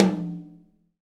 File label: TOM, Roland, Real Kit